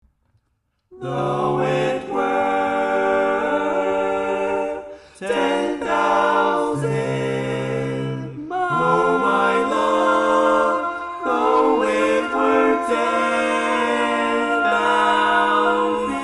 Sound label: Lead, barbershop tags